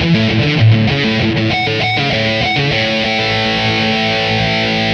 rhythm, 100

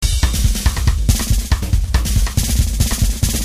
Crankin 140

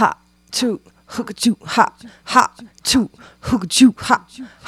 Vocal Echoes

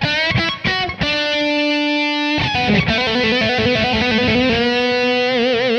E16 89 solo

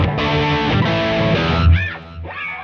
90 Chorus Rock E; Loops

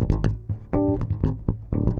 Bb 120 Funk Rock

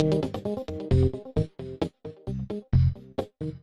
Funky 125-132 Fm7 9

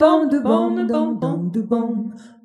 Jazzy Harmony

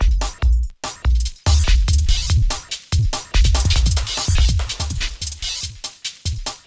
DnB Variations 144

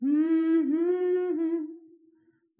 Soft Soulfull Solo

